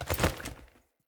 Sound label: Body Fall; Characters; Enemy; Foley; Sinecure; Melee Grunt